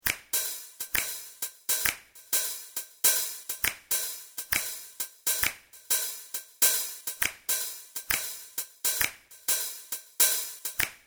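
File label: Musical looping finger click rhythm with hi hat; Musical Sound Design; Finger Click Swing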